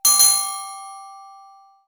Success Chime Double

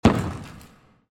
Small Ice Cream Sandwich Explosion; Cookie Jam

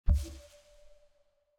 Desert SFX, Flower Bounce Small D2

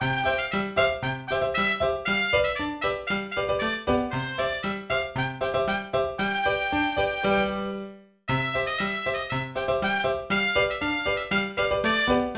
Taiko Bgm; nodame cantabile

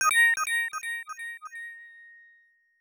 Coin

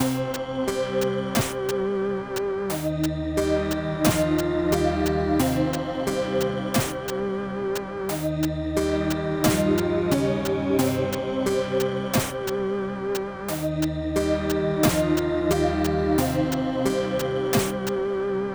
Soft - Mellow Chiptune loop

